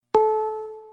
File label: news alert